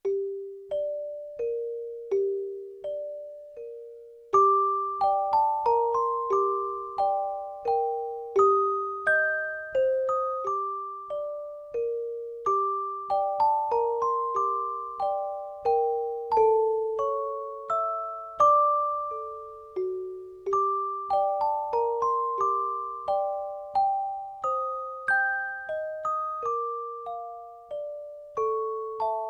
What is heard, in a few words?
Celesta, Yamaha TX16W